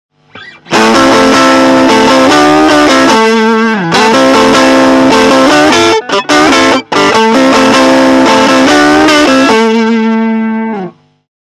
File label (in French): strat manche tubescreamer; fender champion600